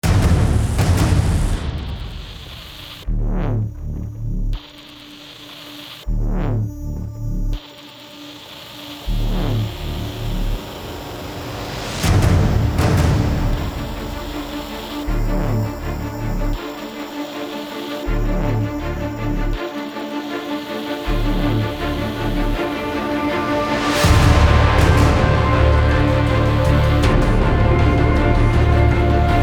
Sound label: no choir